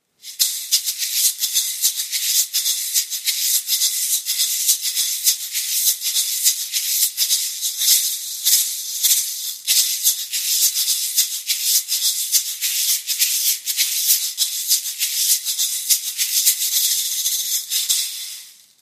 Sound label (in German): Shaker Marmorei gross